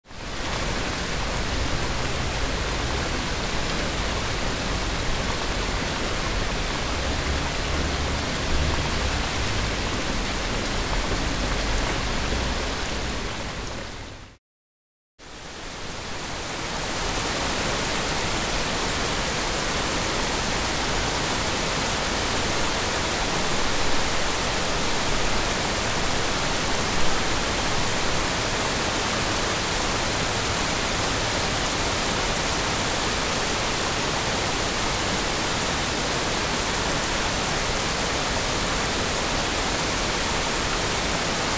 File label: ASMR Heavy Rain on the windowsill